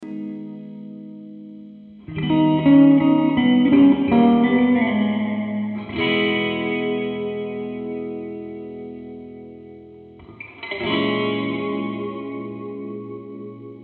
medium hall gtr, Clean chordal part: Sweet and soulful.